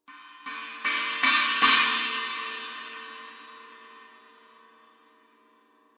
18 I China crescendo hits, cymbal

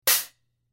14 K Custom FX HiHats Closed Hit, cymbal

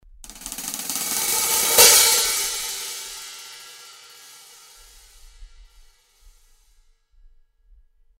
Crescendo Closed to Open, cymbal

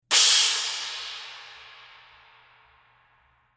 19 Special Dry Trash Crash Crash, cymbal

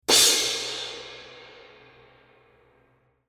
18 Custom Dry Crash Crash, cymbal

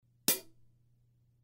S Mastersound Hats Closed Hit, cymbal

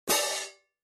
16in Concert Stage Pairs

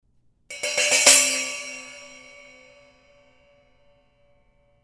Oriental China, cymbal

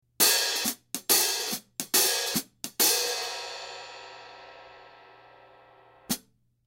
15 Custom HiHats Pattern

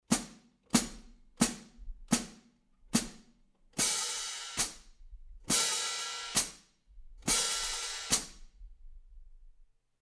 Foot Chicks, cymbal

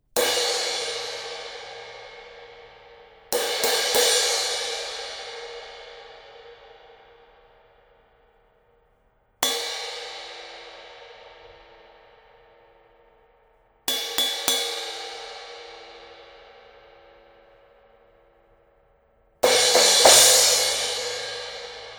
Cluster Crash, cymbal

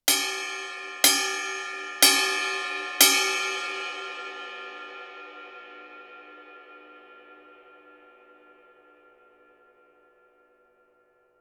K Projection Ride Bell, cymbal sound; 21" Kプロジェクション・ライド; 力強く切れのあるベル; クレイグ・レイノルズとのコラボレーションでデザインされた21" Kプロジェクション・ライドは、力強く切れのあるベルと、パワフルでクラッシュ可能なボウを両立。

